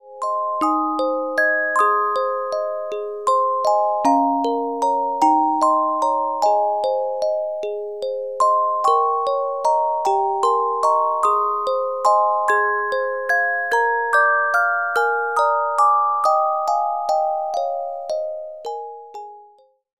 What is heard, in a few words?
Carillon Music Box Melodies